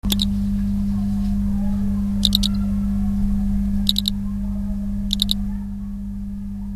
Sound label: Mantella baroni; voice